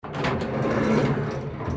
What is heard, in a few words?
Accordion door opening; Robobeat